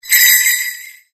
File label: explosion; Mistletoe